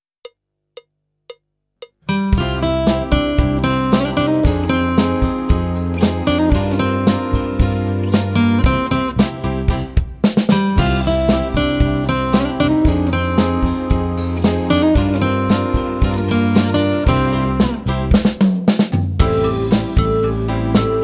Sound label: Rock Changes